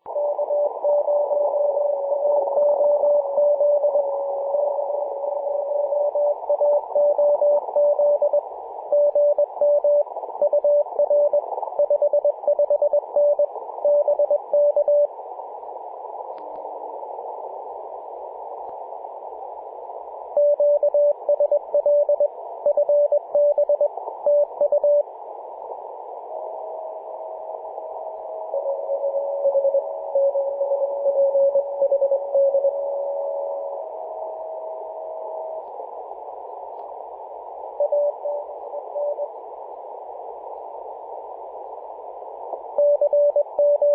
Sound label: 28.035 mhz cw